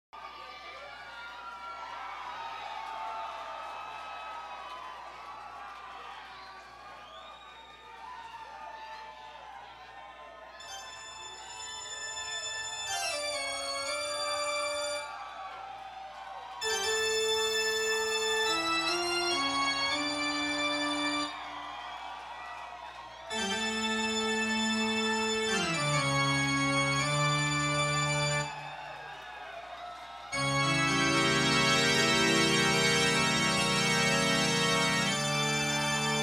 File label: Tocatta and Fugue in D Minor; The Egyptian Room The Murat Centre Indianapolis IN USA